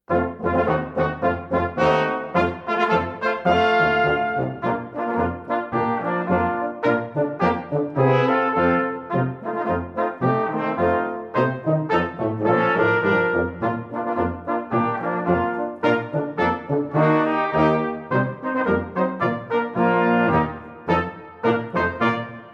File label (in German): Holzknecht Polka